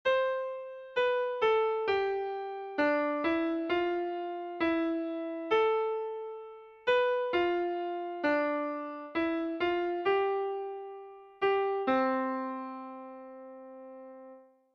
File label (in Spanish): Cadencia dominante tonica